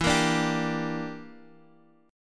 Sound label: E Minor 7th Flat 5th, Guitar, DADGAD; chord shape